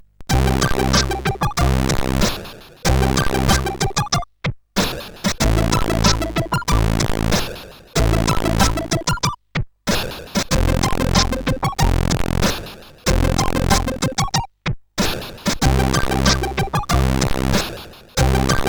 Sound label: Star Music, CSG8580R5